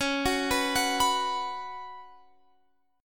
B Suspended 2nd, Db, chord

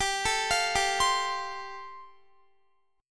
G 7th Suspended 2nd Suspended 4th, Guitar; G7sus2sus4 arpeggiated

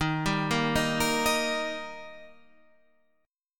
Eb Major, down, Guitar, Standard; arpeggiated; JGuitar

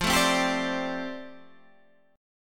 F 6th Add 9th F down Guitar Standard, chord; F6add9 strummed

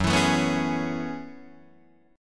Gb Minor 11th Gb Guitar DADGAD; down; chord shape

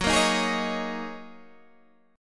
F sharp 6th Add 9th F sharp Guitar DADGAD

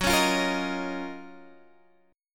F sharp Major 13th F sharp, Guitar Half Step Down

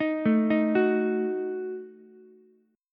Eb Diminished, Ukulele; Ebdim arpeggiated; JGuitar

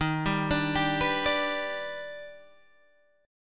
D sharp Augmented Major 7th D sharp, chord

